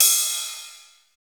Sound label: CYM CHEEZ, Cheez Cyms